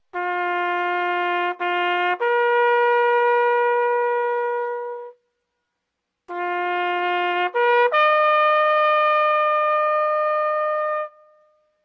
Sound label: Taps